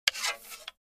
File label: Bow & Arrow Pullback; Single Take Of Wood Arrow Sliding Against Bow While Being Drawn Back To Fire. Smooth Wood Scraping Sound. Medium Perspective.